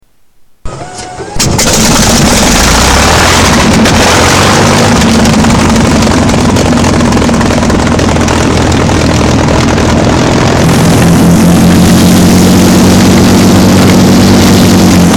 P51; turbine; turbinesoundstudios

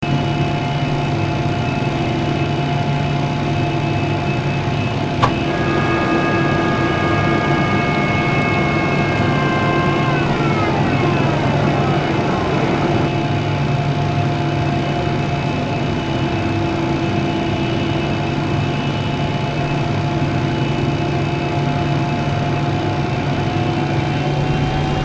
CFM56-3B Interior; synchrosoft

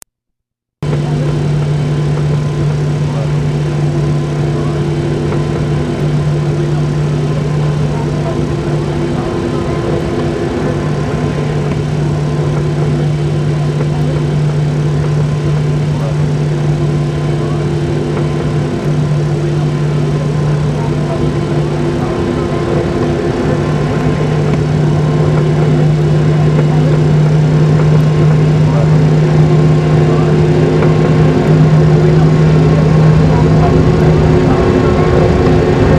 Cfm56-7b Interior; synchrosoft

